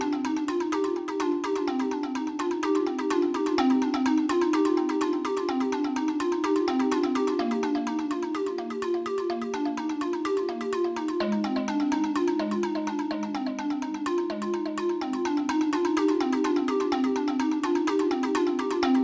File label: future Loop; SGI O2 Out of Box Experience 2.2